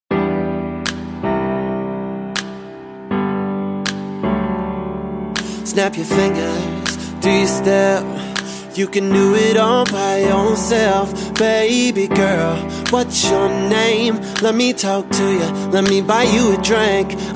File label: Vocal RIngtone